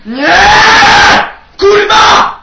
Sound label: death sound; franko